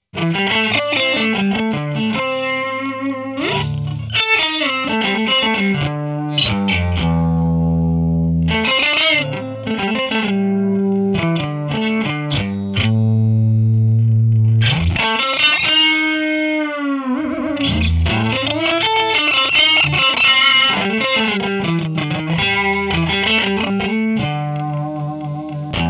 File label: Delay Doubled